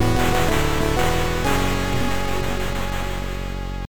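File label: Drink a Life Potion, game music; prince of persia; pc 98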